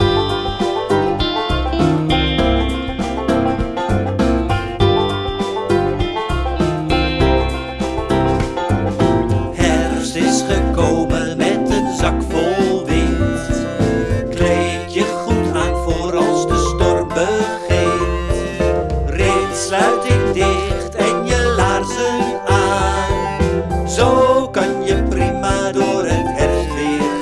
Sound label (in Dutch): melodie